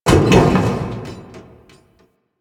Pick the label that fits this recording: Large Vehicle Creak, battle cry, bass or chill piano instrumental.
Large Vehicle Creak